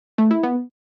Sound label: Low Battery; Gome; U7